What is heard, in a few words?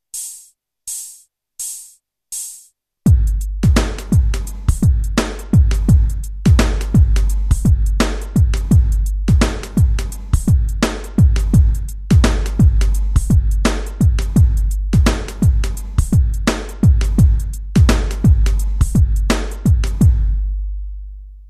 drum